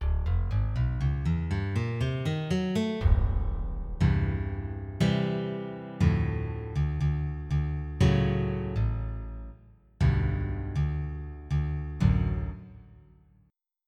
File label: Dry Guitar